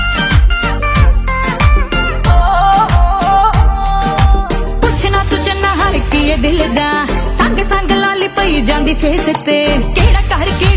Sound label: punjabi ringtone